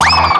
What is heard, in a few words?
Scroll Page; Bop It Sounds